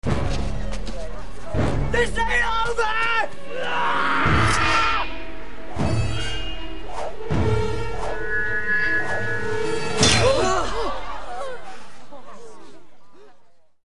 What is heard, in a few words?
Sword throw; film tv; Drillbit Taylor